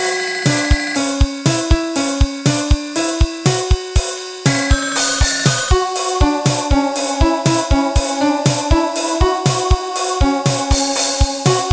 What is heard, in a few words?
after five ringer